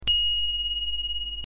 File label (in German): Fahrradklingel; Clever I messing